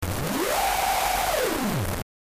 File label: Screech